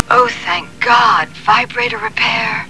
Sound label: fix vibe